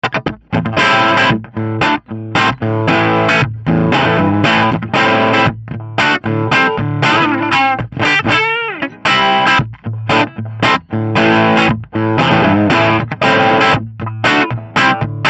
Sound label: Triode Half